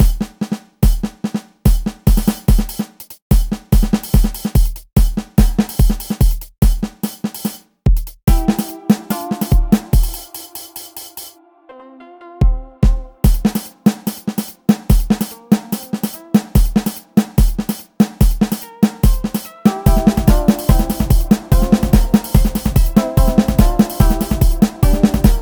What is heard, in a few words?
Instrumental; Friday Night Funkin' Internet's Down PC gamerip